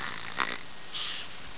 SNORE

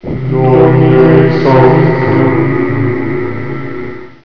Healer; Ultima III v1.2; Ultima Sounds

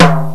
jazz tom; Sounds Terrific II; Weird Science; Amiga-PC

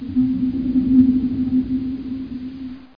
Spooky

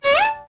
viol; Microsoft Graphics Studio Greetings; Microsoft 1998

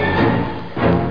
concert

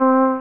Basson; HyperMusique

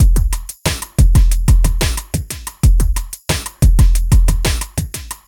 beat; macmoney

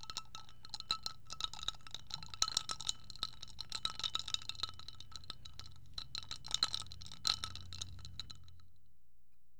ice cubes

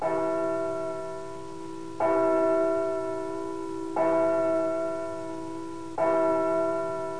clock

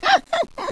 (GIGGLE); Simpsons Cartoon Studio™ Demo

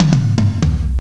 next level; BonYx 2.0

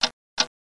tick; GameRes

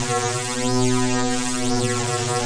BEE; moonchld

